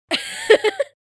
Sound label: Female laughing